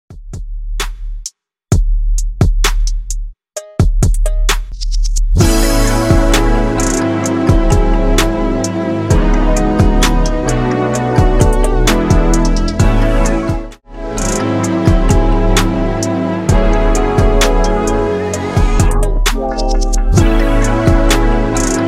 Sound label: RnB Re Drum